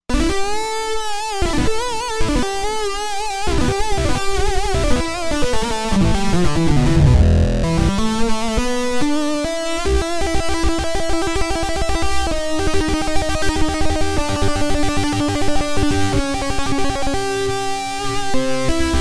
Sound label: Lead Mean Lead